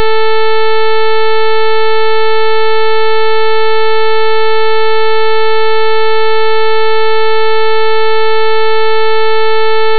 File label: sawtooth; pedersini